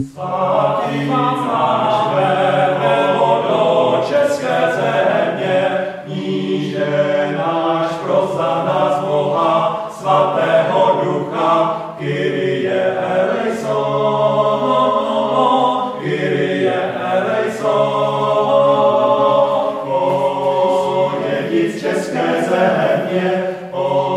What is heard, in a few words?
choral; svaty vaclave